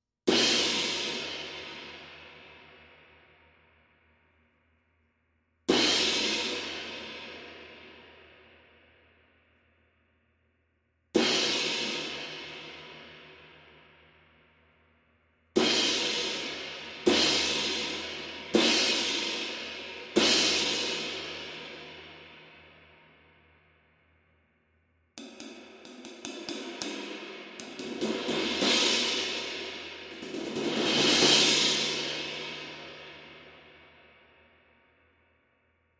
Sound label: 22 Crash